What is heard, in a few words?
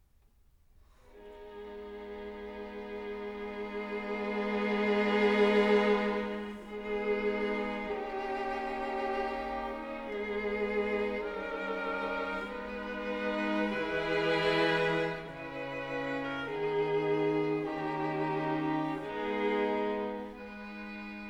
quartet in c sharp minor adagio quasi un poco andante; op 131; attacca